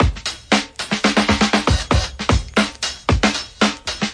human machine break, 116 bpm, drum loops and breaks